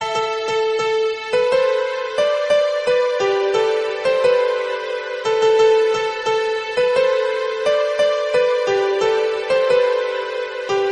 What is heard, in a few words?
Instrumental